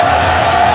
crystal soul spear loop

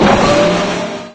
move start no vo; doom3; sabaoth

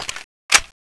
clip in; tfa cso; pgm

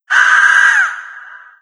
pain, cherub; doom3

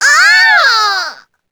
succubus dying; queen girl

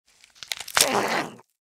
ghoul reaver equip; npc

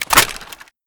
pkm cover up; weapons

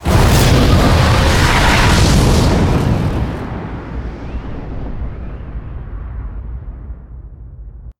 explosion huge

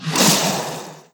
swing hit powerful, weapons; darksouls

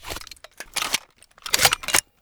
reload rpg

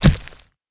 alien flesh

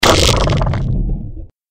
mirelurk injured; magmalurk; npc

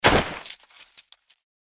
bodyfall; npc; cazadore

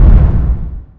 mancubus step; doom3

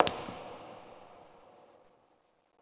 succubus step; queen girl

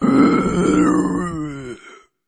zombie die

